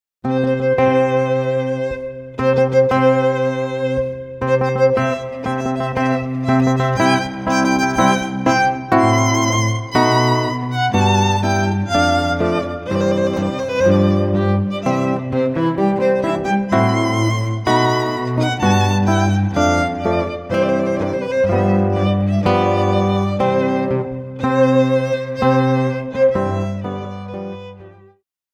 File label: Wedding March, Trio, guitar violin cello; Mendelssohn